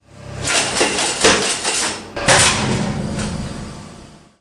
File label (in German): Schaltwerk